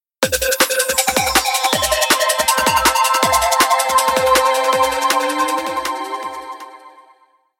Tunes of Warble; Panasonic P80; ringtones

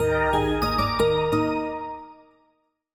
Power Off; G4; SKT; LG

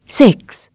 key

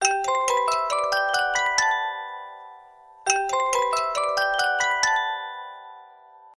Tinkling Tone; Samsung; Galaxy A5 2014

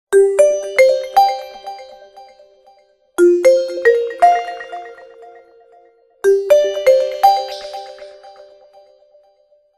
Alarm; Windows Mobile 6.5

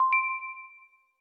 light unlock; LG V10

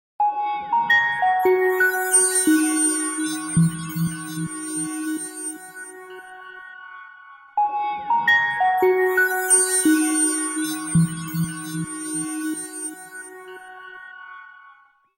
Sinesong; Nokia N96

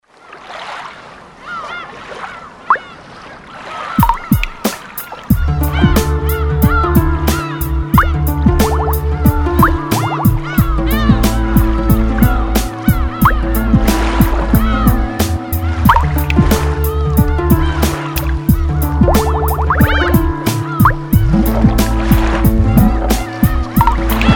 Whisper Of Water, Ringtones

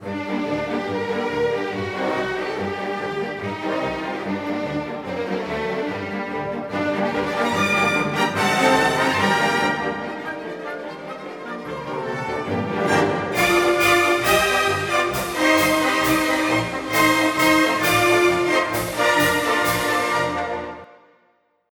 Hungarian Dance, ringtones, Classical Harmonies; Google; Pixel-4

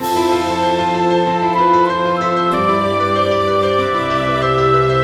classic, ringtones; Google; NewWave Labs

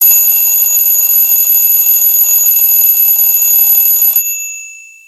The traditional alarm, alarms; BLU Studio-5.5-S

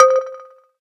electric, keytone

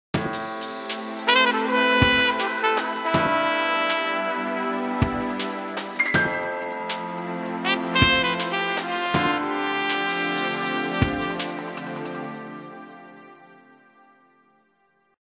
Lush, Nokia Tones

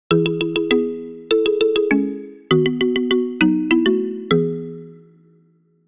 joyous, ringtones

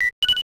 Beep; SKY IM-S400L; Camera; Shutter Sound

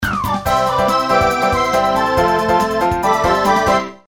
Power Up, On _ Off Sounds; LG; U370 Disney Mobile

